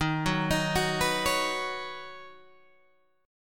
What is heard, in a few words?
Eb Minor 7th Sharp 5th Eb Guitar Open G